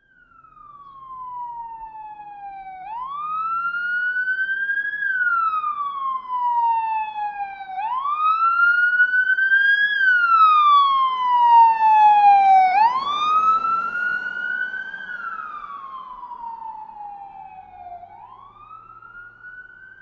Police Siren: Medium Car By, with Various Types And Without Engine Noise.